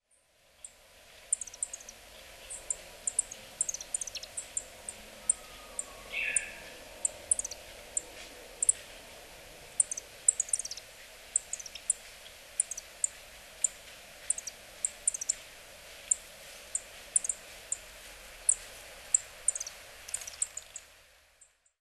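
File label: yellow-throated bunting, hyogo; miyamahoojiro; koe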